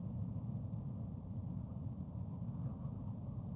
Wind intern; Arcatera The Dark Brotherhood

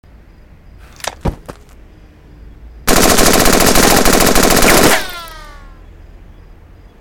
Assault Rifle; Stealing The Diamond

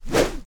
SFX werewolf attack; From Shadows

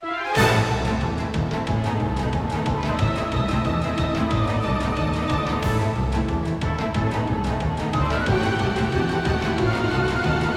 goblin music

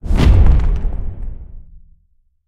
level up impact; The Adventure Pals

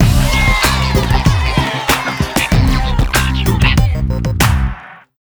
CHEER; Buzz! Junior Robojam; PS2

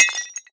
Physics Pottery Impact; Counter-Strike Global Offensive; PS3 Windows Xbox 360

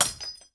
cup Breaks; Undertaker's 2017 gamerip PC

